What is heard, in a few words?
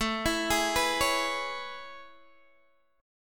A Major 9th A, Guitar, DADGAD